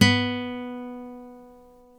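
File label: C3 classical guitar; InVision Interactive; Lightware VOL-1; Instruments & Percussions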